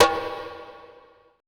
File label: ETH DRUM